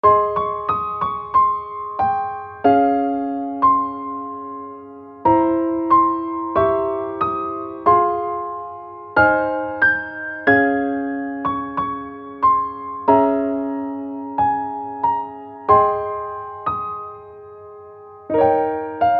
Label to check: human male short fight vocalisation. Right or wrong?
Wrong